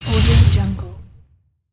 giant robot footstep

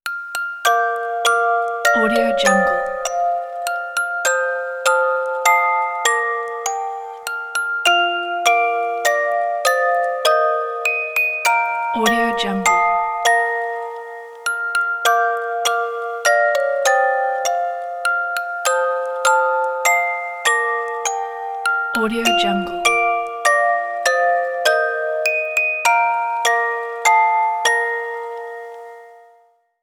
happy birthday music box

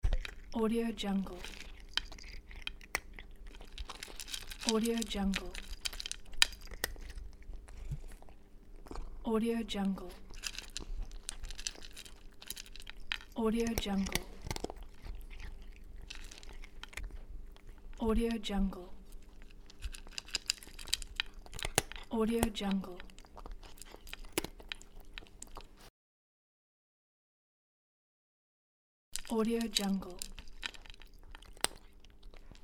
cat eating

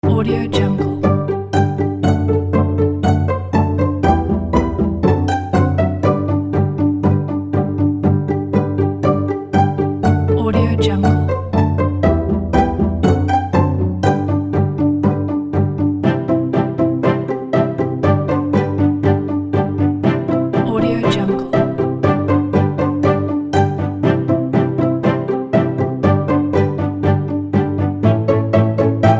uplifting light inspiring strings

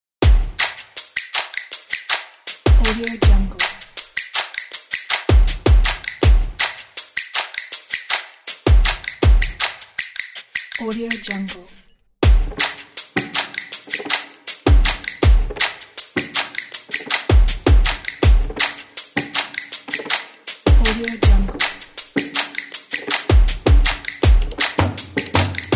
drums beat

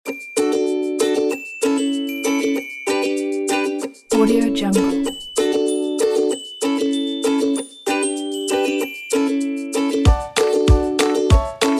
baby and toys

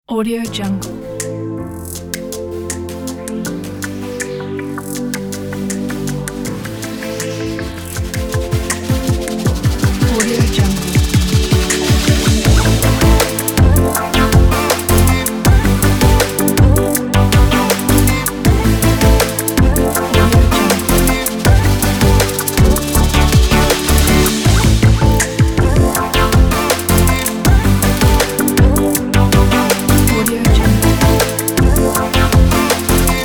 technological future bass

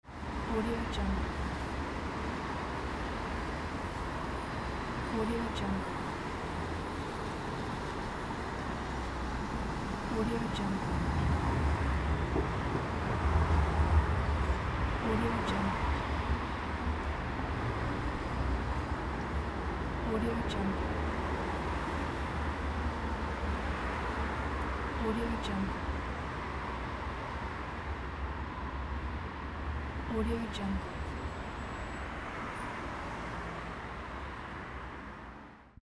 evening city

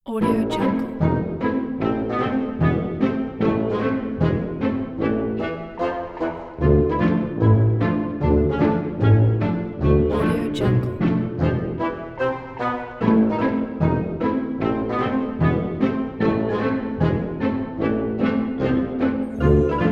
this is comedy

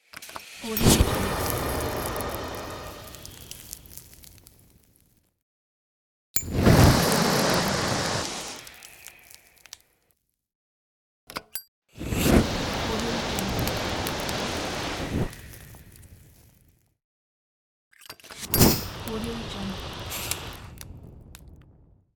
flamethrower; warpjumper

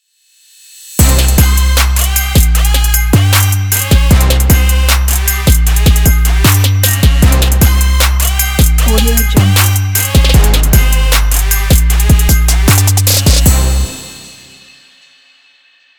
hip hop trap sport logo

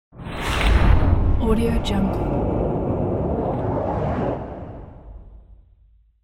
underwater whoosh

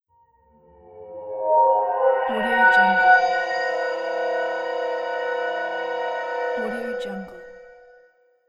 ambient howling noise

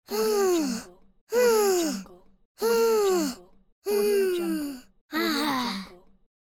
little boy annoyed

